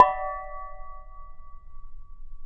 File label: aluminum reflector bell; Weird and Interesting Hits